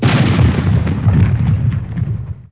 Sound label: cannon